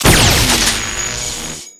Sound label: impact; Player FX